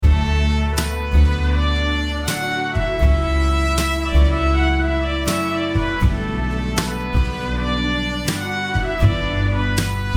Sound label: Instrumental